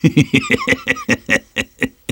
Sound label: laugh evil; Sniper